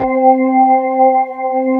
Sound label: B3 HAM, Keyboards Old School